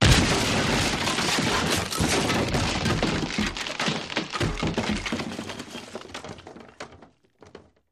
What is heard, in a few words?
Crash Through Wall, Car